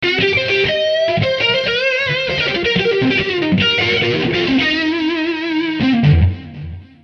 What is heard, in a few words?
C 090 solo